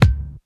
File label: loud kick sample c sharp key, loudest frequency: 445Hz